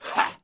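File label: Knight Attack Vox; Civ4 warlords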